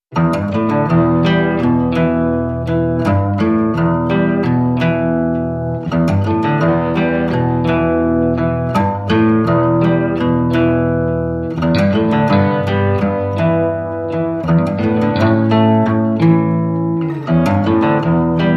Guitars Only

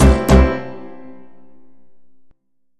Hit

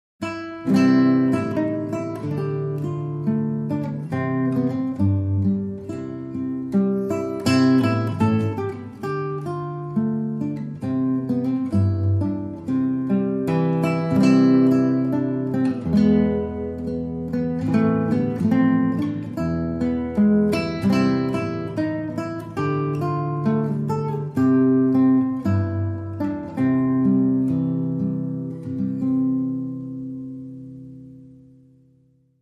Solo Guitar

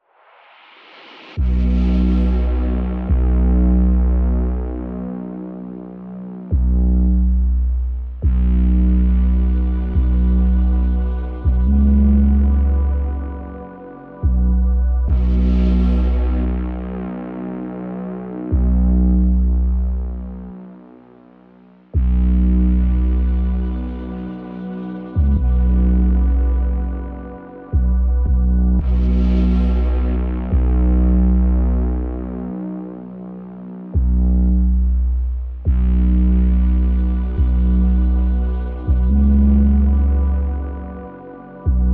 Ambient

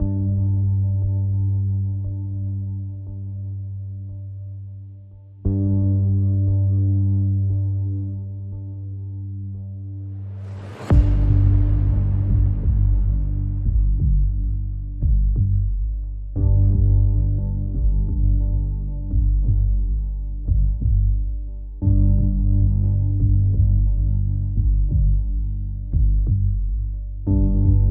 Son Of A Killer, Synths Percussion and Effects

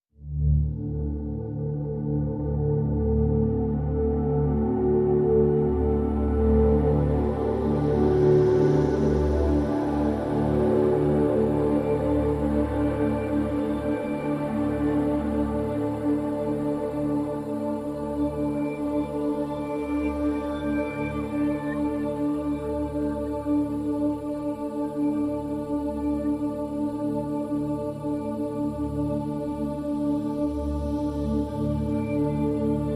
Floating, Pads Only

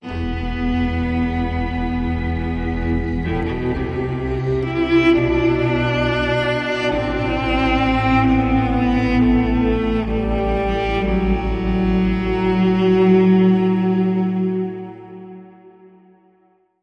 Cello

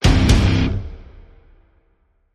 Stab Guitar Double (C)

